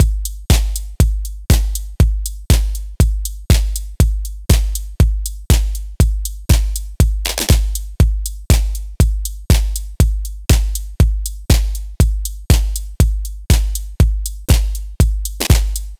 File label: Mixed Beat, EDM; Sonic Foundry (Sony Creative Software); Afterhours EDM; Retro Active